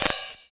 OP HAT

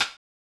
high rimshot; Giga Samples Collection; EMUSP12

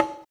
Conga; Equipped Music; Loungin House

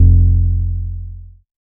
Bass, Synths, 5U Modular

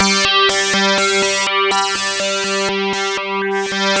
ZIBBLE C4, YAMAHA FM SYNTHS; Rubber Chicken Software Sample Bank

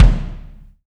kick, ragga dub reggae

